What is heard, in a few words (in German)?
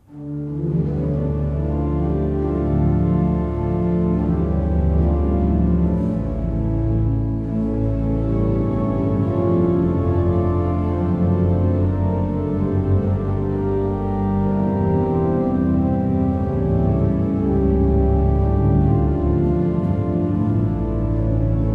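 Seifert Koeln, pfeifenorgeln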